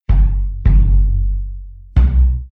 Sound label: SNTHBASS D+B 160 C, Drum Bass, Bass; eJay